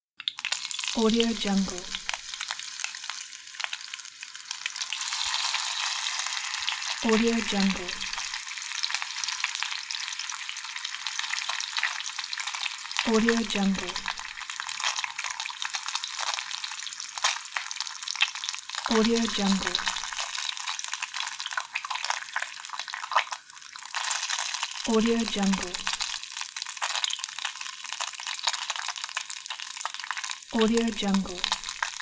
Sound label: sizzle; eternal future productions